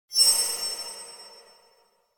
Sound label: Impact Metallic High Squeaky Impact; Hollywood Edge; sound designer's toolkit v2